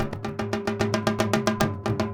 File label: India, Heart of Asia